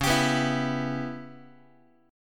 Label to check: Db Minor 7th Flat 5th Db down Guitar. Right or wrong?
Right